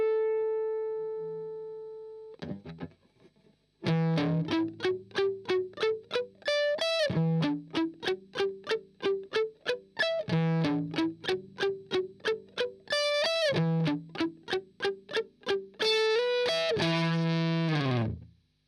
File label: Arp